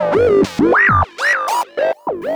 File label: NRG SFX, Trance; Sonic Foundry (Sony Creative Software); Trance NRG VOL-1